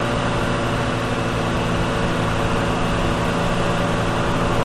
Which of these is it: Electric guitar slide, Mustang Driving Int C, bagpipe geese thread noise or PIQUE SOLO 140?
Mustang Driving Int C